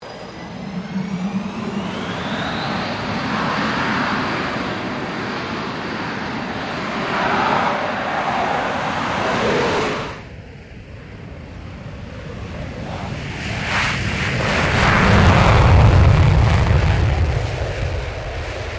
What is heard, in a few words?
AIRCRAFT FA-18 HORNET TAKEOFF STEREO; RAAF FA-18 Hornet; Airplane; Vehicles; Aircraft Collection